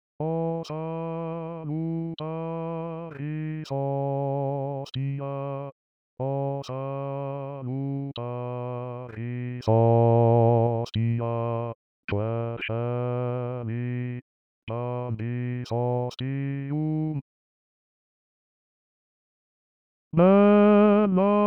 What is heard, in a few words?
Basses; Liszt; O Salutaris